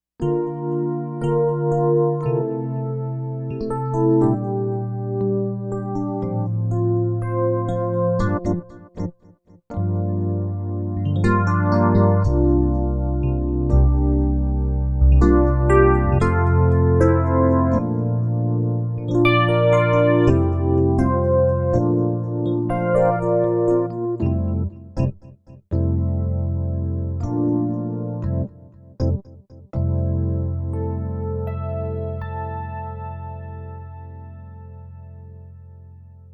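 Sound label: FM electric piano; FM EP1; JUNO-Di Patch Demo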